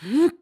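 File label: Lift, voice; BOTW; Breath of the Wild; Link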